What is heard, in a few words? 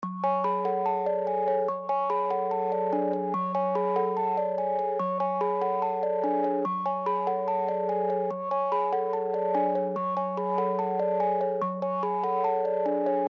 Melody Loop Fmin 145 BPM Keys